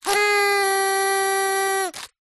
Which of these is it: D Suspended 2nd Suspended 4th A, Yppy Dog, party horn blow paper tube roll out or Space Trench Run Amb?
party horn blow paper tube roll out